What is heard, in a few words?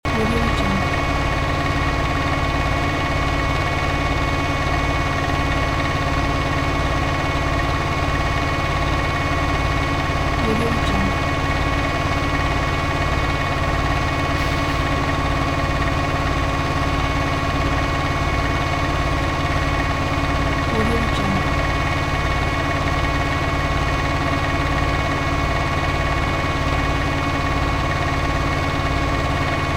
road asphalting machine working loop